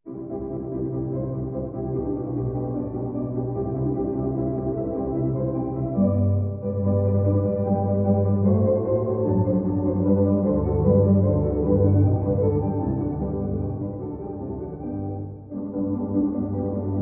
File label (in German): Musikbeispiel mit Gehoerschaden